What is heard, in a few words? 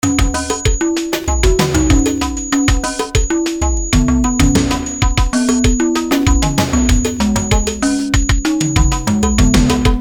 Afro latin beat